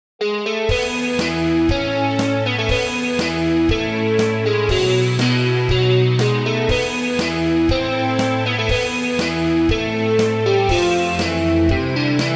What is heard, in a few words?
beats